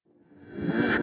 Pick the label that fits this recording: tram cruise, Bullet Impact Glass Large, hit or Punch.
hit